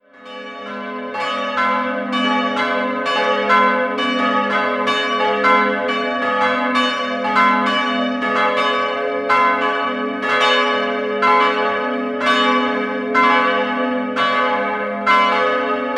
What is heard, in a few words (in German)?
glocken; Heroldsberg